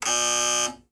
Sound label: Buz; FRACTIONS PIZZA